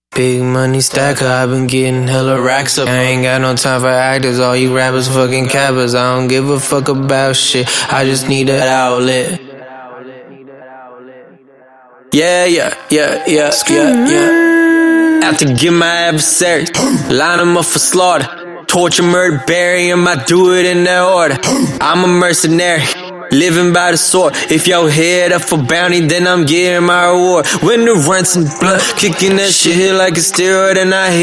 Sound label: BPM103 Key C, Acapellas